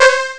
Pitch Brass, Amiga Soundtracker; ST-XX Amiga Soundtracker Sample Packs

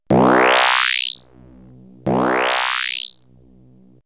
UP ET DOWN

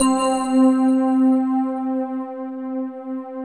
JD990 FANT, BELLS; Best Service; Alpine Volksmusik

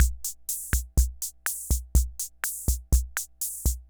Ace Tone Rhythm Fever Loop

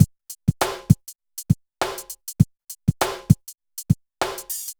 BPM100 SYN

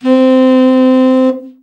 TEN SFT, SAX; Best Service Gigapack 1; BR SAX 3

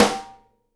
snare GLASS, Live Snares

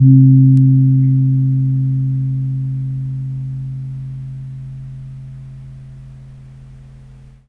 KEMPUR, KEMPUR GONG; Spectrasonics; Heart of Asia

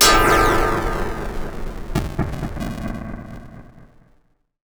Bit Crush & Moog Delay, Wire & Tin, contact mic

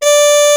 MUTE TPT. D5; Greytsounds; Scott Peers Sound Engineering

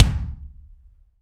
BD AMBIENT, Drums; Real Mega Drums

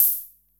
Vermona DRM1

